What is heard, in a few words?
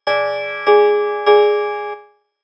piano